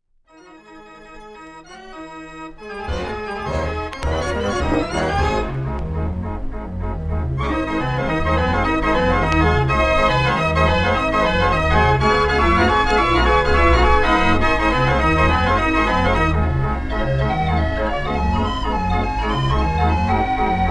Harmonica Boogie, draaiorgel; 112er carl frei konzertorgel; die wehrle barth orgel

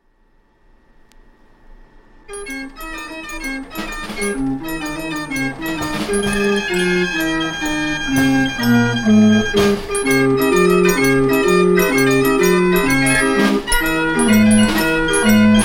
chiappa fairground organ

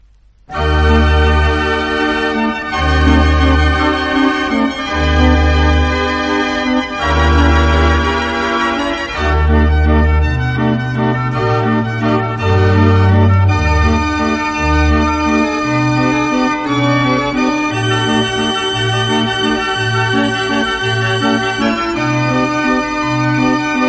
Ave Maria, draaiorgel; de zeventiger

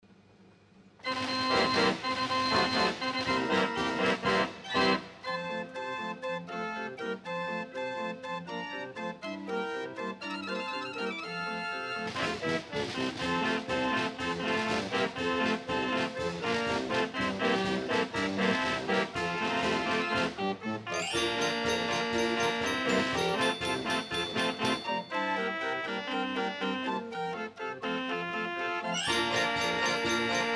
Anneliese, draaiorgel; 35er voigt; Ridderings

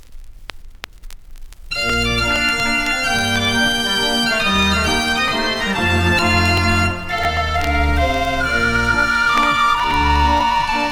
Rosen in Tirol, dutch street organ

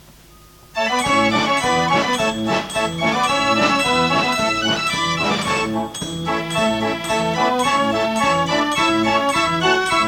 Baccarole, draaiorgel; de sater

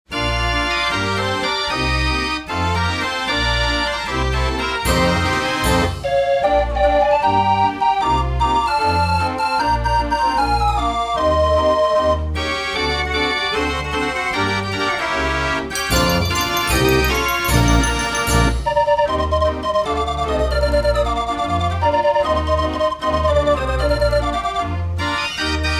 Als Sterren Flonk rend aan, draaiorgel; de vijf prominenten

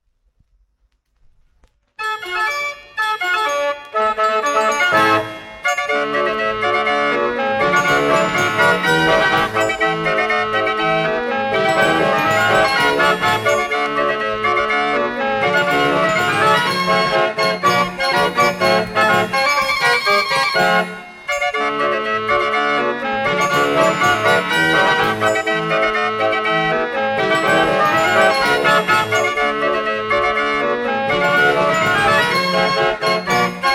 bruder orgel; Grüße aus Waldkirch

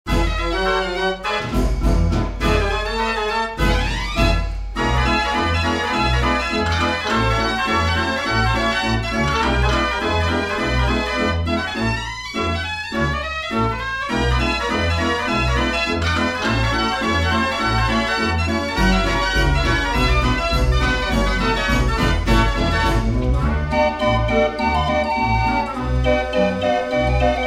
draaiorgel; het zonnetje

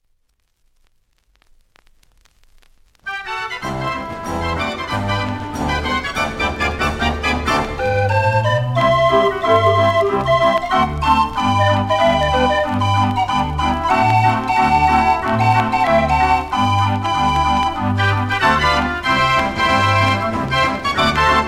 draaiorgel; de klok; remember holland

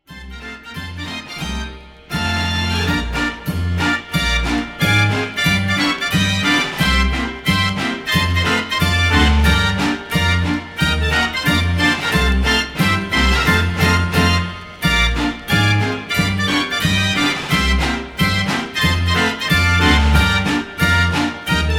Tiroler Twist, draaiorgel; De Veronica

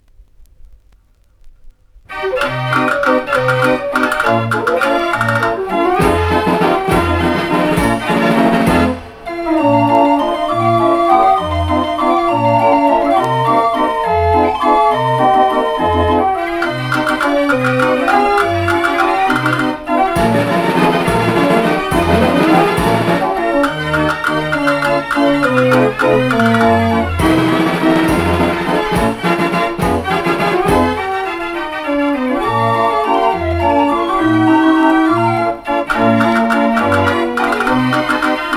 draaiorgel

